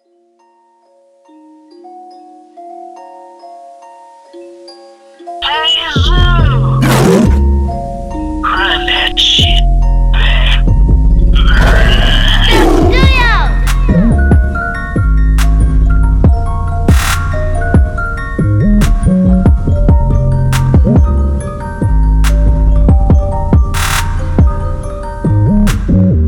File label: type beat